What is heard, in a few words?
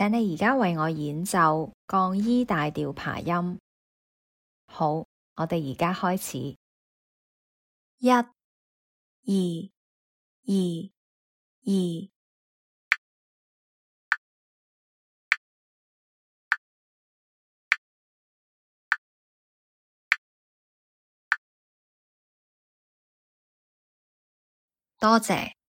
E flat maj arp